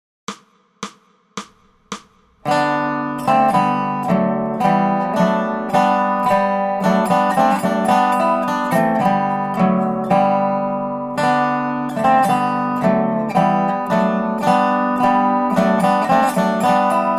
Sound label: Dobro; Deck the Halls